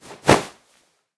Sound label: Old Whip